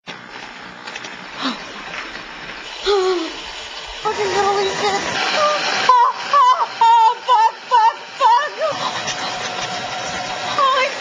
Uuh Aah

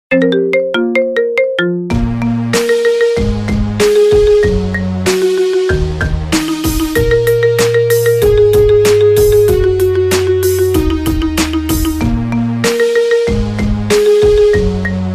marimba remix

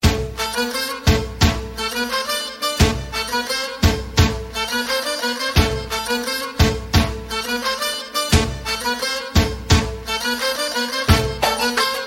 Nice Arabic Musik

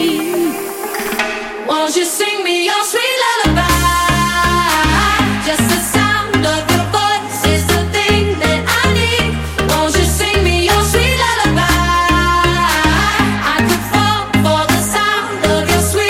Lullaby

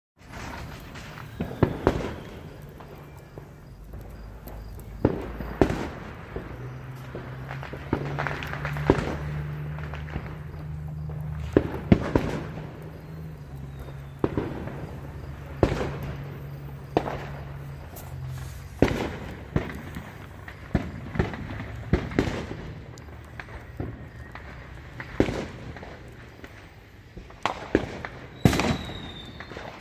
Fireworks

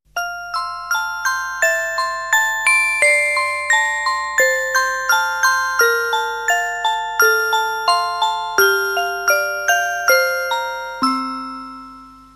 Musicbox

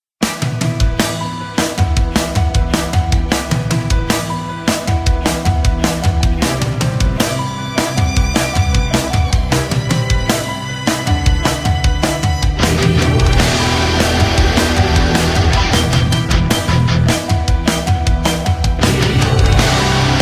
Drum Intro Heavy

